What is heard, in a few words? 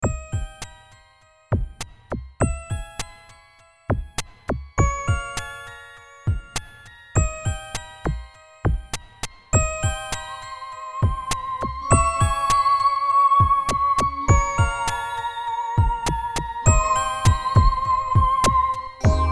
Synth